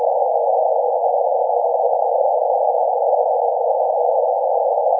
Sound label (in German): Signal LIGO; Gravitationswellen